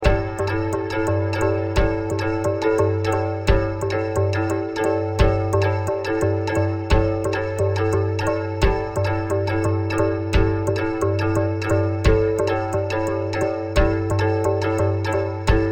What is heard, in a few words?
2 5 polyrhythm